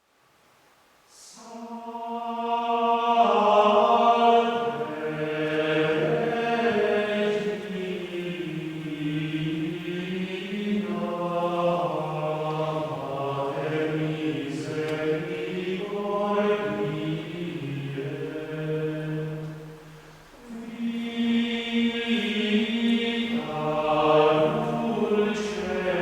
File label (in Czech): chorál; táborové zvuky